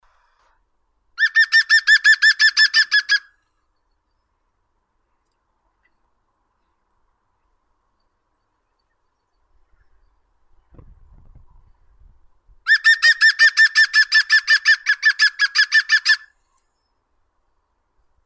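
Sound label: green woodpecker, picus viridis; dyatel zelenii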